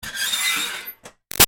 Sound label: Screech Metal Iron Board Short; Screech , Metal , Ironing Board , Short , Lite; Materials